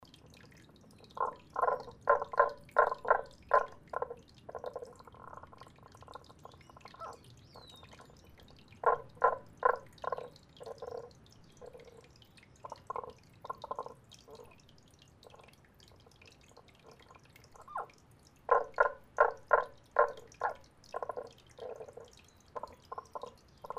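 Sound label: unknown animal in drain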